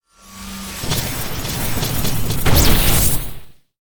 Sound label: Trap Energy Turret; monster